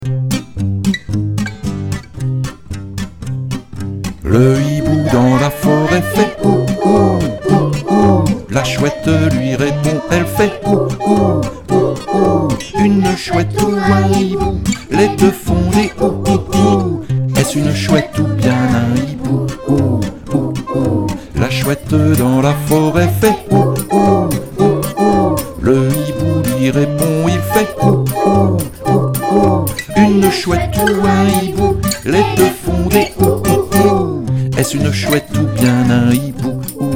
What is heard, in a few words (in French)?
Chanson La chouette et le hibou